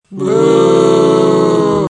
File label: booo